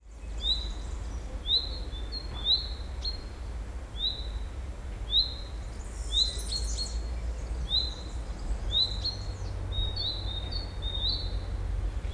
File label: Ambient